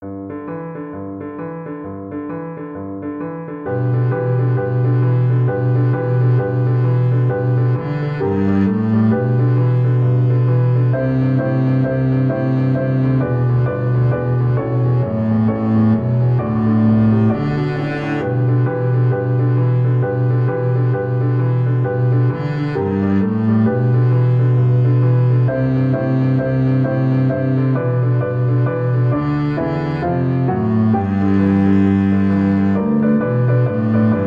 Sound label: jingle bells boogie, double bass